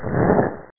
fireball; ARCADE

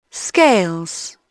SCALES; Dorling Kindersley My First Incredible Amazing Dictionary

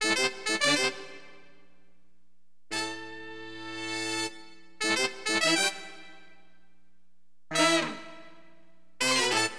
FNK HORN, Wind; LiveAct Pool; AcidJazz Funk

